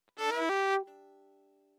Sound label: Violin